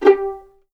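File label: G4 pizzicato forte right, violins; East West; The Ultimate String Collection; VLNS PIZZ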